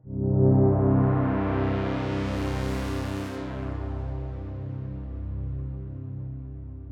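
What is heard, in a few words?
Polytone jupiter; Reason 13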